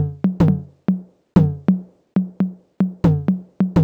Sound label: drum hurtme percussion 125, Drum Loops, Classic Chicago House